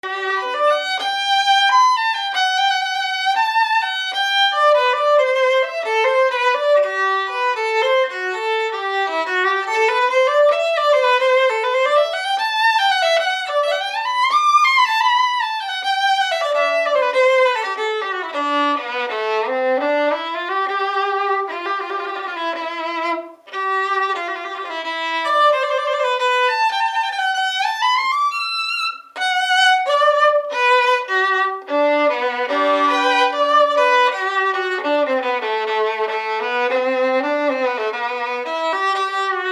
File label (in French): Violon; Delille